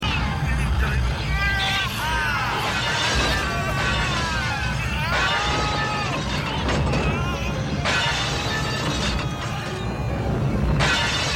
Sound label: car crash; uninvited; glass that shatter one by one as the truck rolls downhill